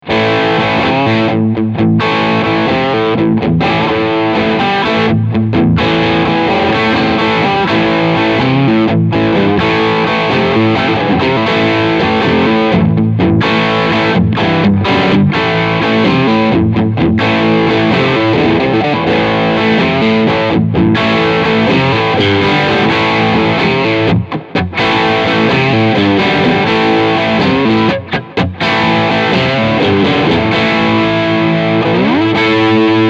Overdrive Steg

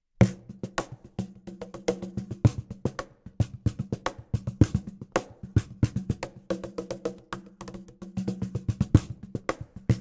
rein akustisch

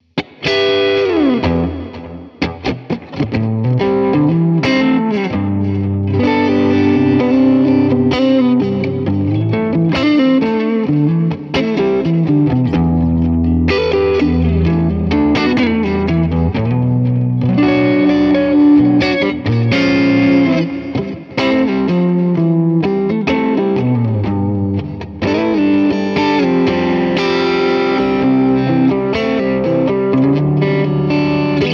Floorboards im Vergleich Crunch Fender Tone Master Pro

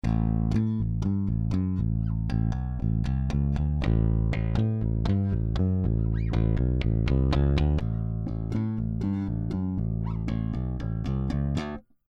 Rickenbacker pur

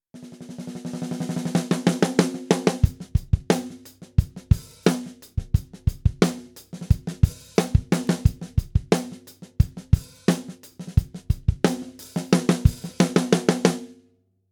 Messing tief gedämpft

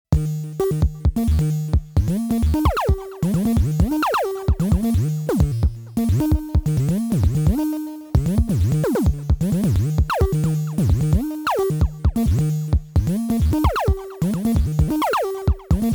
Melodie Glide; Five12

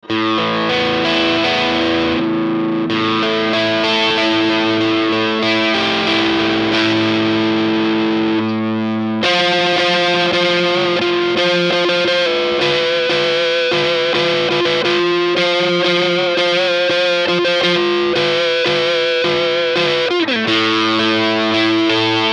ESP MH 400 Mid Zerre

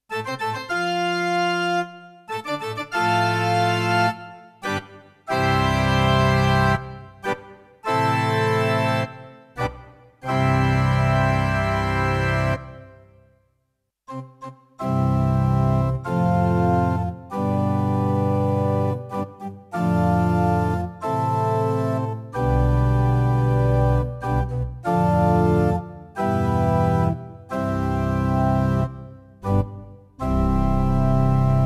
Orgel

